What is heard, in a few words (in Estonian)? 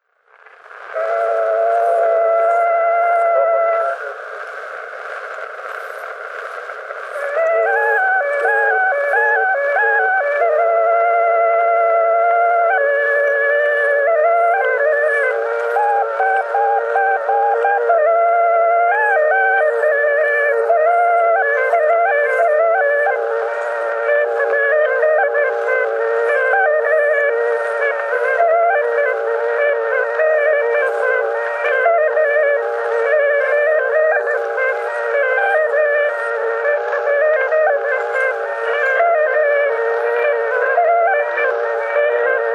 Torupilli Jussi lugu, Emmaste, torupill, folk